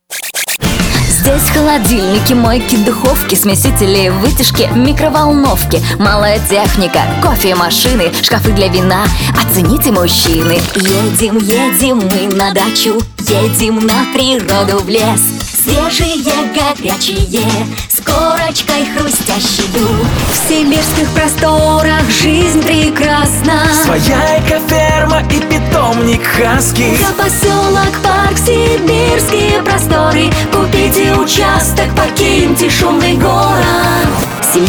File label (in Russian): vokal